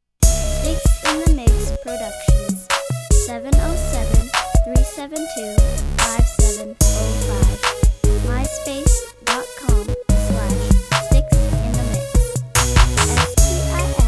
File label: crunk; crunk magic